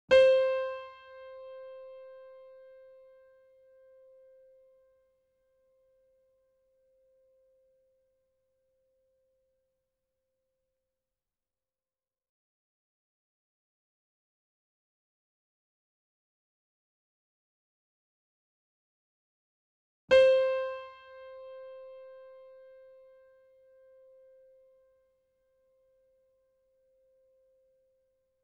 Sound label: C5 E5 A5 Grand Piano & Dig Piano; Sound Sampling Collection VOL-3; Grand Piano 88